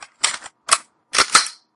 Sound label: reload; zombie hunter